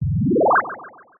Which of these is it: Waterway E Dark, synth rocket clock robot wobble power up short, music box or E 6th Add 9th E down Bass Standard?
synth rocket clock robot wobble power up short